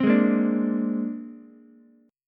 C 7th Suspended 2nd Sharp 5th, C, down, Ukulele; strummed; chord; C7sus2#5

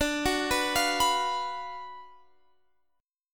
Gb Major 7th Suspended 4th Sharp 5th

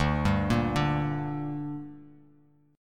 D Suspended 4th Sharp 5th D down, chord; arpeggiated

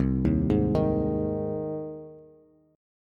C Minor Sharp 5th C down Bass; arpeggiated; JGuitar

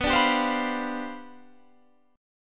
C Major 7th Suspended 2nd Suspended 4th B, chord

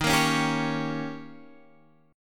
Eb 7th, Guitar, Drop D

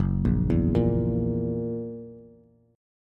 F sharp 7th, Bass; arpeggiated; JGuitar

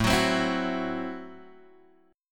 A Minor 6th A Guitar Drop D

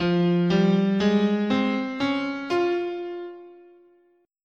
F Hirajoshi, scale

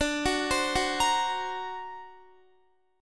D Augmented 7th D down Guitar; D+7 arpeggiated

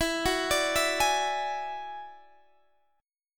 Gb 7th Suspended 2nd Sharp 5th E

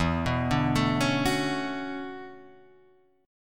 B Minor 9th E, chord; Bm9/E; arpeggiated; JGuitar

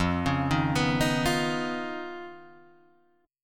Eb 7th Suspended 2nd Sharp 5th F

